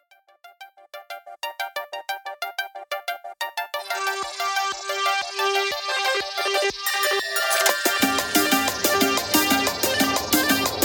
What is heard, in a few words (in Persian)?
ماکت 6و8 عاشقانه